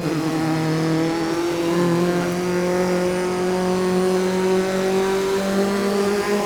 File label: fourth cruise, vehicles